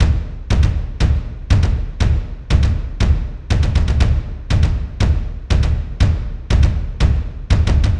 Perc Stem Loop 120, Lows; Drums; Perc Construction Kits; Ghosthack; Quantum Force 4; Enter The Void

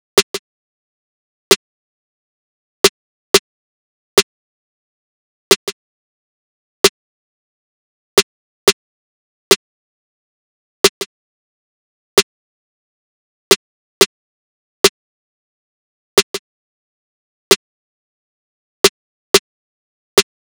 Drum Loop - 90 BPM Snare; Loop Stems; Cymatics; Mystery Pack Vol 4; Pillow Talk

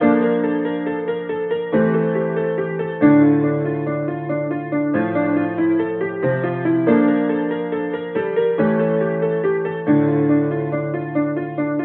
Melody D 140, Hip Hop and Trap; Handlebar; Ghosthack

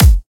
Dubstep Kick; Ghosthack; Dubstep Sound Arsenal

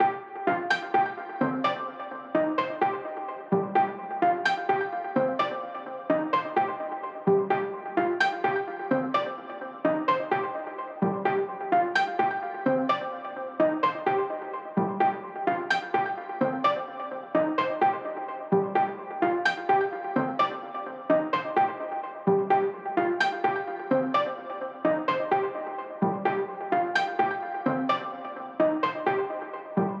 128 BPM C Min Juno-60 Pluck; Synth; Loops; Loop Stems; ARP+JU60; Cymatics; Fantasy Synth Sample Pack; Fantasy - Yesterday

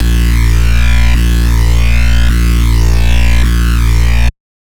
Bassline, Dubstep and Trap

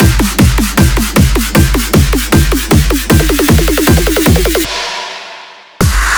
fill loop 155 4 bars; GhostHack

